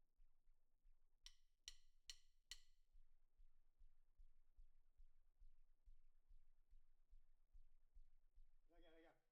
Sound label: Snare Bot